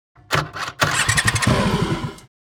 Motorbike ignition fail; vehicle

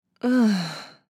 Tired female moan

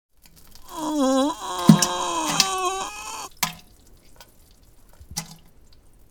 Person having a poo pooping with vocalization

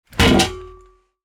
Metal fire extinguisher put down

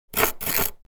Ice scraping frozen ice block

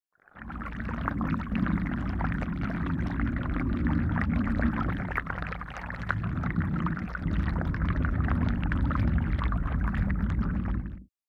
Water flowing underwater perspective

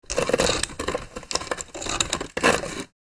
Werewolf claws scratching surface

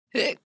Short female hiccup

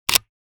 Camera shutter click taking picture

